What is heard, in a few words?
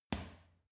boxing punching bag uppercut soft